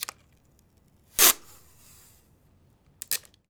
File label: TAPE, STATIONERY; AKAI S-Series CD-ROM Sound Library VOL-6